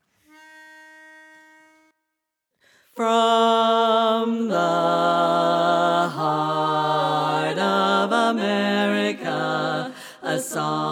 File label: Regional Song Bass